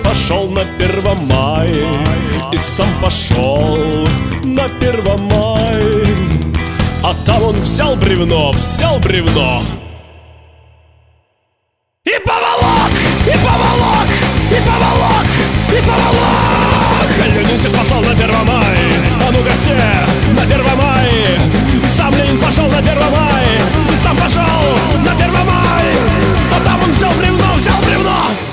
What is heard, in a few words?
original bassline